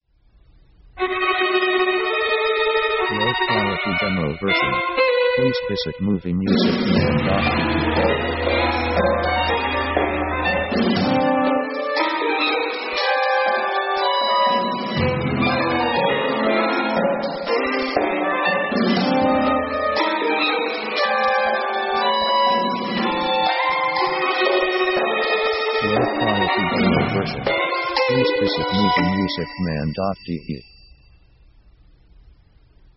orc alleatoric, strange; strange themes

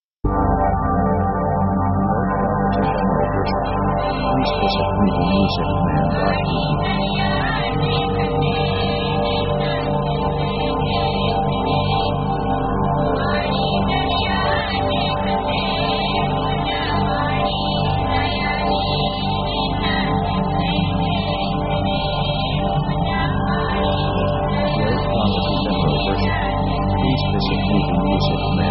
magic east; synth themes

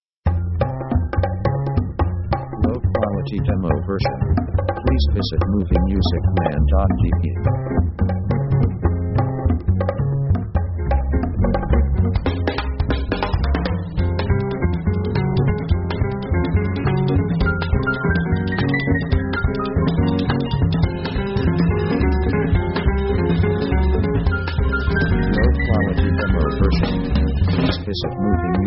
jazz fast latin

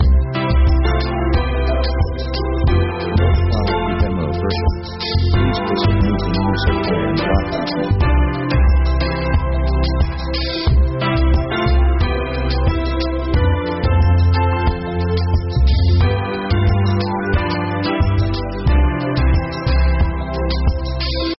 easy listening 90bpm highlife

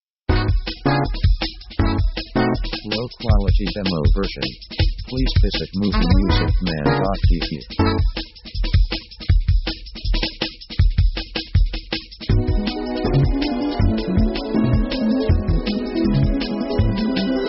rush hour, fast

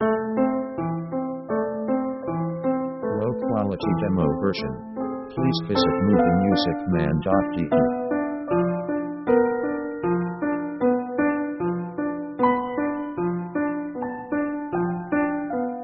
orchestra melo 77bpm watschelnd; orchestra themes

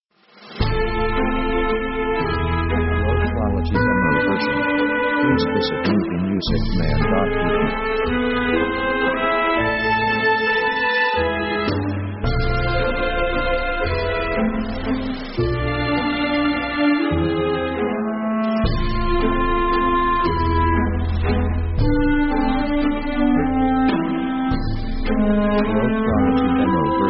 european mournful; orchestra themes

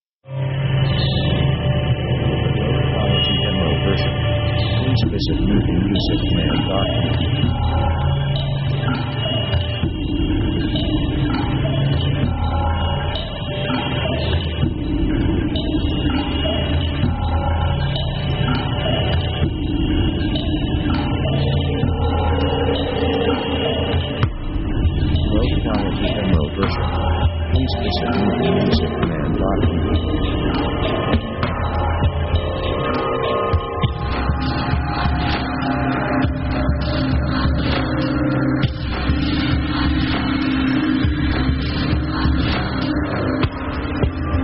automatic factory; Dark mood

